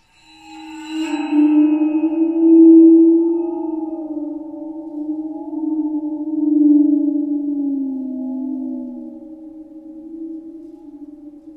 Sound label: Waterphone, Bogen